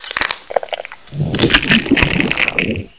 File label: chew victim, Barnacle; Half-Life